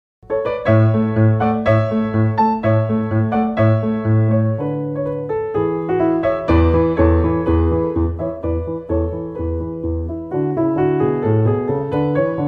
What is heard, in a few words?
MUSIC; The Christmas Tree; Music Narrative